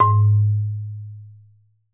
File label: marimba soft G#1, Marimba; Sampleheads - Dave Samuels Marimba & Vibes